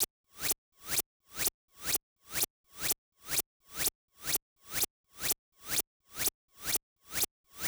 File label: Fx Rv Loop 125; Equipped Music; Loungin House; Perc Loops 125